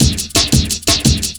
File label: Still Break, Breaks, Jungle; Blu Mar Ten - Jungle Jungle - 1989 to 1999 Samplepack